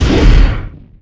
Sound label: Flame Thrower Shot End; Weapons